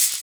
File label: Shaker; Equipped Music; Loungin House